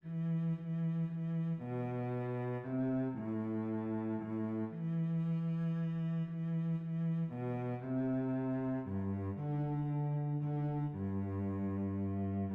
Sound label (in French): VIOLONCELLE; ALLELUIA de Schutz